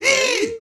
BRAZIL, World Voices

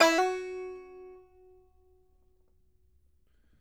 GB4, BANJO NOTES; Sampleheads; Whole Lotta Country 1 & 2

